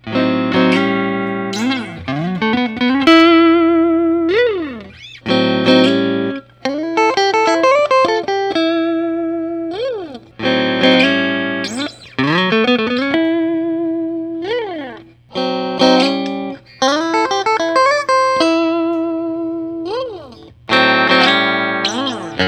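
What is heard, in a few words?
fender custom shop noneck 60 strat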